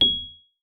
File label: G#7, RHODES; Xsample VOL-1